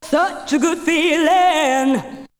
Vocal; ZERO G - Datafile DISC 2

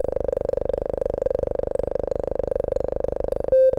tech fx; Best Service; Hyper Dance; EFFECTS

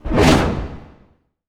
cinematic impact wipe

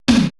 80S DRUM SET

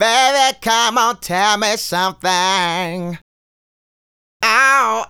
Male Solo, Vocal

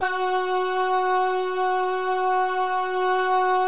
BAH GUY, BAHS GUYS; Spectrasonics; Vocal Planet; Jazz FX

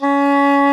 DOUBLE REEDS; OMI - Universe of Sounds

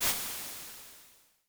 Crash Waves, Vermona Mono15 Drums

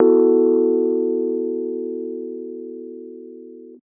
125 Rhodes Dom E, Keys, Nu Jazz House

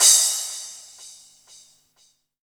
crash, Dance Mega Drums; Best Service; CRASHES

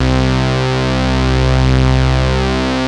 C3, BASS; Q Up Arts; Dream Experience

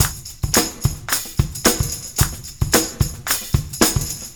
Combo, R&B Drums

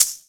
Shaker; Equipped Music; Loungin House

